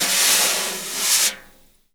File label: 4X15 SWIS, BRUSHES; Big Fish Audio; Ross Garfield Drums VOL-2